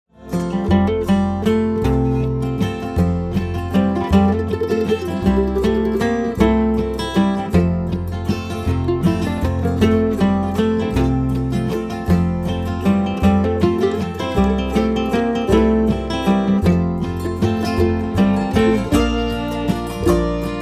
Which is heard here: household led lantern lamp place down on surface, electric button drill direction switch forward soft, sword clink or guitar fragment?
guitar fragment